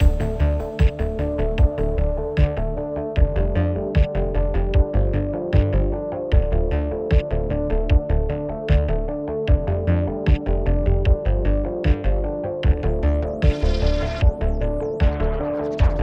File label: song Loop